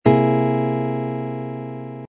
c dominant seventh sharp five chord voicing, guitar